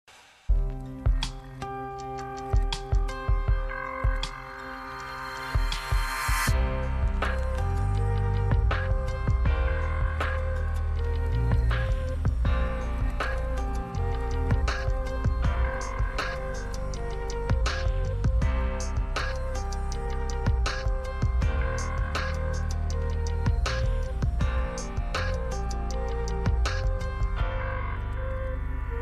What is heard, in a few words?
ringtone